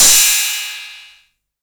crash cymbal drum sample d sharp key, Royality free crash cymbal drum sound tuned to the D# note.